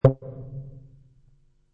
Space Input, Short, low frequency blip with reverb; Science Fiction Sound Effects; LynneMusic